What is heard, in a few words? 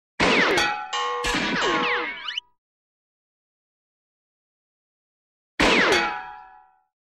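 pistol shot with ricochet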